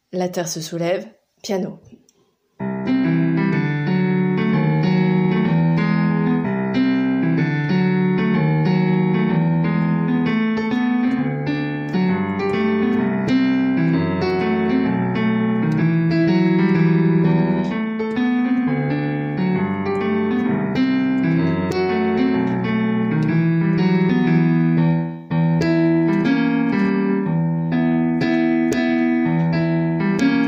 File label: piano